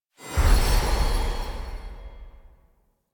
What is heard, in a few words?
NPC Interaction Close, UI; Warframe; Entrati